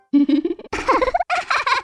Laughing; Midna

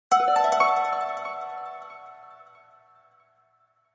Item Pick up Fanfare

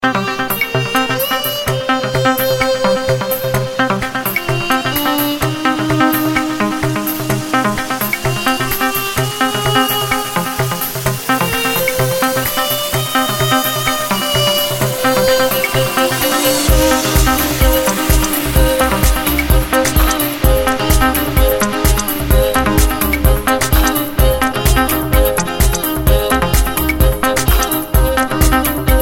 Hypnotic Violin

